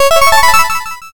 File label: oneup